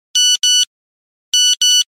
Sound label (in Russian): nokia message staryi